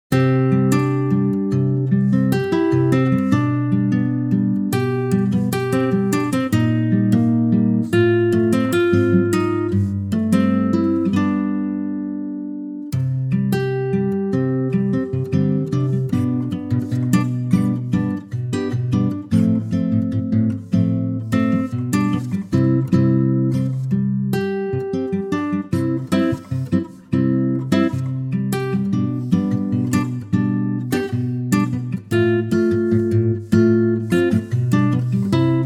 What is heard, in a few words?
C Db D acoustic guitar; key - C to Db to D - vocal range - G to A (optional B top note); A gorgeous latin acoustic guitar arrangement